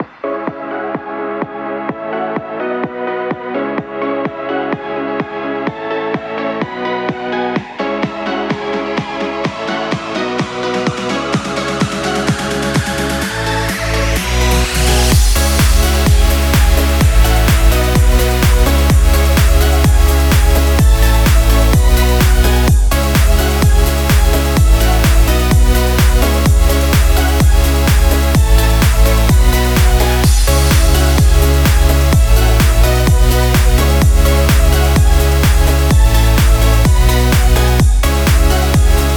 positive upbeat dance pop

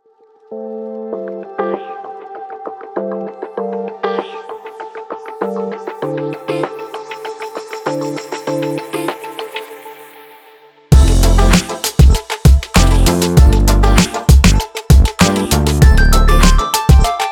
bright fashion background

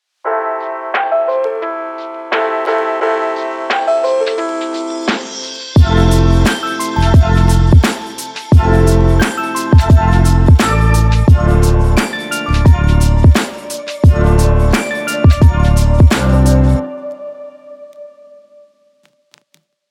chill vlog hip hop

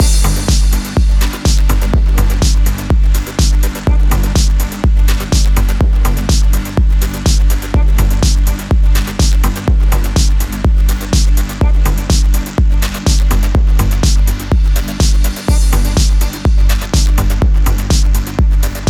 atmospheric tech

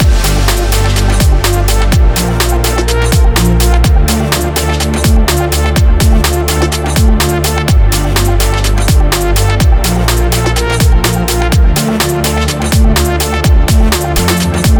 innovative background technology loop

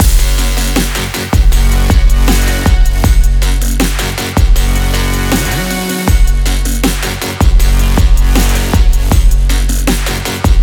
sport action music loop

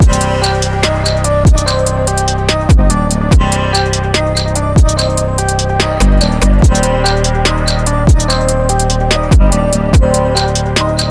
brutal sports beats loop

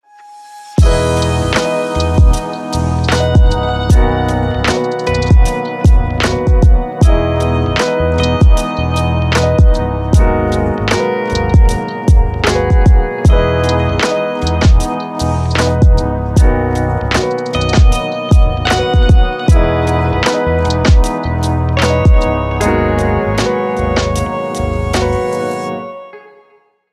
cozy christmas lo fi

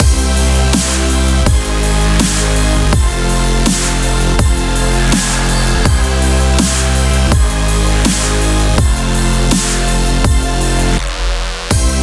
epic sport anthem dubstep loop

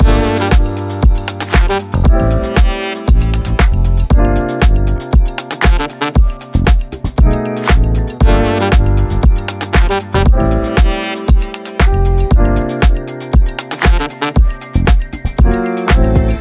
smooth jazzy vibes

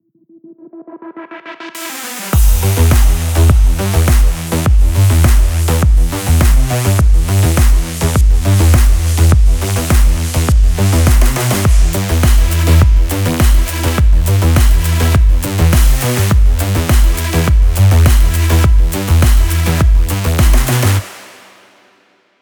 action sport

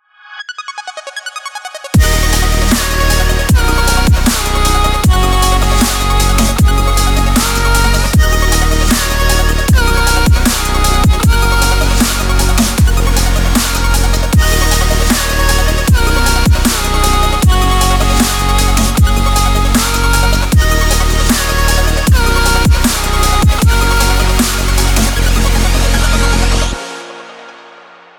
sport intensity

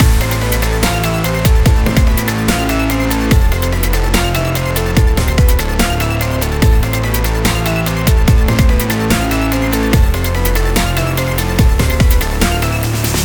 action sports dubstep loop

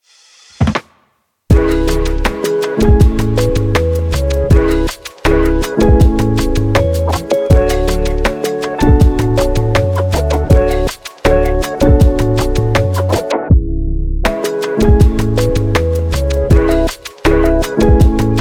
smooth lofi chill

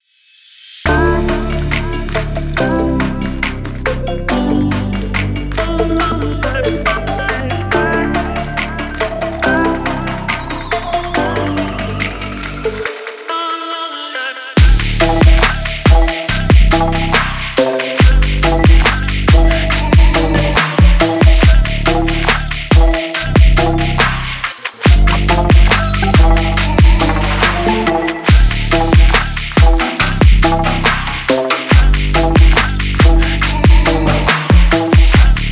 stylish background

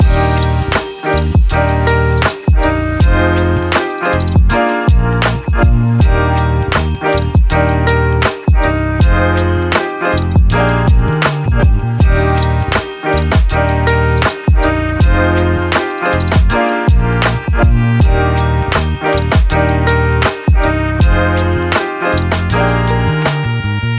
christmas shopping vibes

